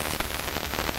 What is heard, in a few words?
static light; cstrike; D26 slender